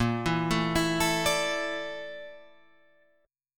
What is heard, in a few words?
A sharp Major 7th Flat 5th A sharp Guitar Drop D